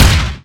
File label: trespass fire